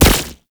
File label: Concrete Archgun Projectile Explode